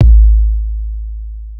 KIK

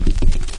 Statik

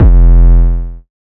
808's

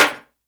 percussion

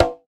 Percs

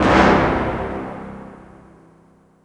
Hit

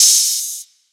Open Hat

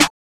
Open Snares

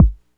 Kicks

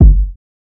Rare Kick

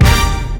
Hit

